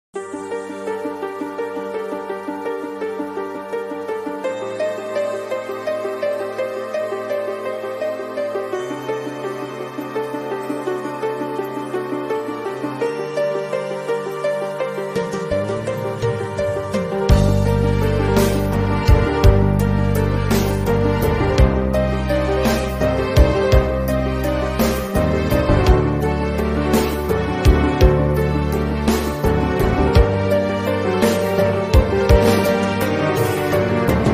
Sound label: Flute